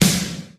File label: treble heavy snare drum sound E key, Royality free snare drum sound tuned to the E note